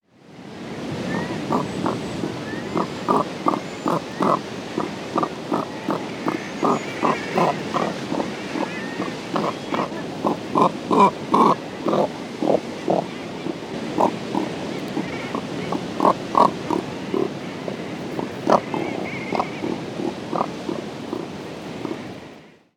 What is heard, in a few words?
cormorant kauk calls, bird song